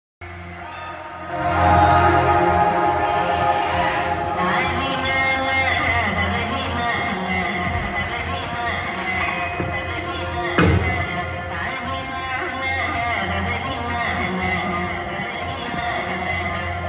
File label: movie heroine entry bgm ringtone; Double Ismart